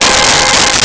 Saw Fifths C5; Nintendo; July 2020 Gigaleak

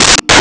Conga Hi; Nintendo; July 2020 Gigaleak

